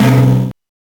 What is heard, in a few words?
Timpani; Genesis